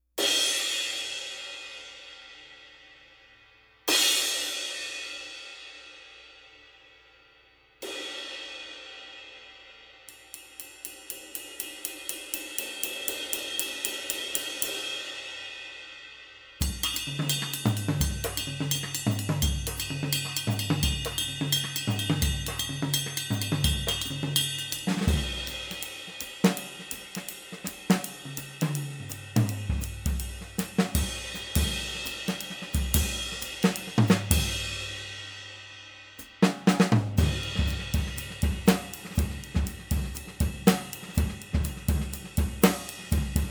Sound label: Mehmet 20, Istanbul